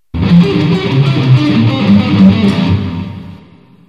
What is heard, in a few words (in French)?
saut corde